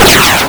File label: Shoot; sound effects for the hibernator